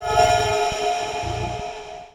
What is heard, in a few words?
Grifon STUN; Lesnoy